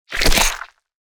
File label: slime splat; Boss Sounds